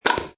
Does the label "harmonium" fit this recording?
No